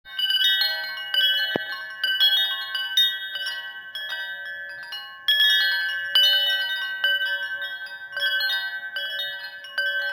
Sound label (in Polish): dzwonek sredni, fengshui; dzwonki